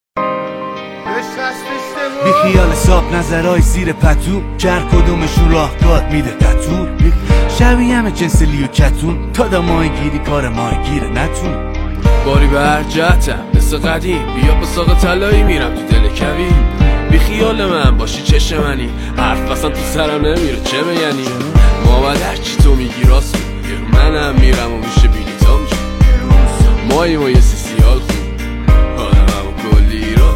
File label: Remix Deep Rap